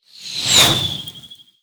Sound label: archer arrow flying